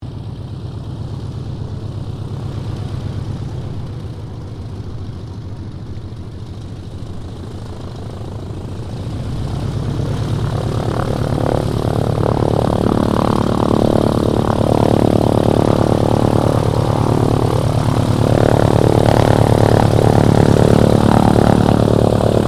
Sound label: AIRCRAFT BOEING PT-17 STEARMAN TAXI SHUTDOWN; PT-17 Stearman Trainer; Airplanes (Sound Effects:Vehicles)